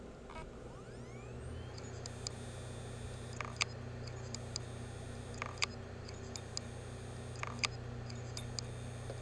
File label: hd sounds, laptop, bad; mac disasters